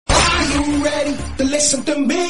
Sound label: telegu movie entry bgm ringtone tune; bunny